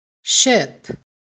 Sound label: Ship; filmora